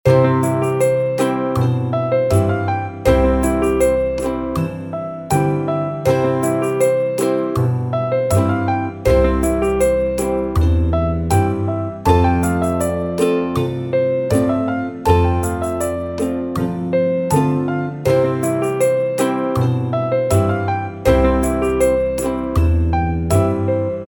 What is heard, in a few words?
short loop no perc FX